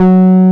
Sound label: TB C5, TB-303; Masterbits; Vintage Voltage